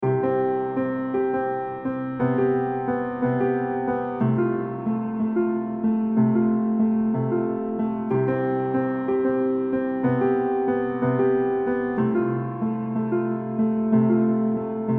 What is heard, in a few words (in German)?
Shakespeare Musik